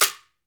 AMAZON SHAKER, Latin Shakers

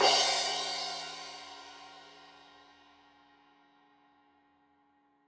Crash 15in L edge, Cymbals Stereo

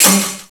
ORCH FZ, FAIRLIGHT CMI IIX STRINGS

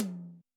TOM, Yamaha SY85 Drums

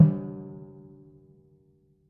G2 p, Timpani, piano; PowerFX; Downtown Orchestra; scales

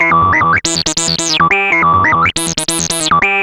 Analoop, Industrial Analog

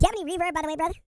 E TALK, Vocal Planet R&B Dance; Spectrasonics

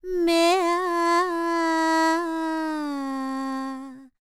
World Voices, INDIA; ILIO; Vocal Planet

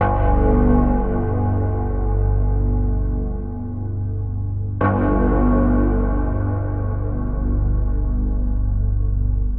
100 G Drone Bell Tolls; Zero-G - Future Wave; Drones

